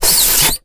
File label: box slide, styrofoam